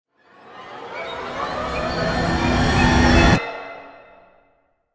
Horror cinematic string riser

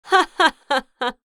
Adult female laughing

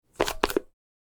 Soap dispenser bathroom pushing or pressing

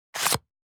Credit card swipe purchase transaction

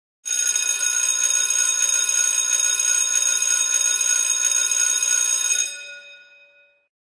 Electric school bell ringing

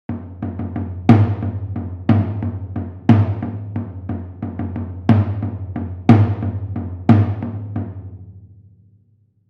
Concert tom simple rythm; gfxsounds

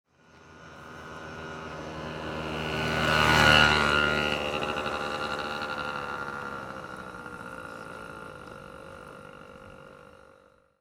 City scooter passing by close exterior

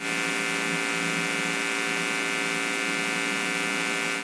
Television static noise

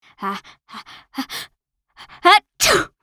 Teen girl sneezing

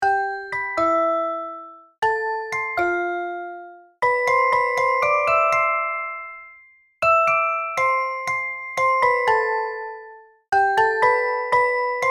Ice cream truck music loopable

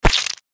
Liquid splash; shorts sound effects

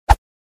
Single whip wooden stick

